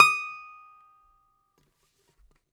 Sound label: domra, Russian strings; World Combos; E-MU Producer Series Vol. 7 – Old World Instruments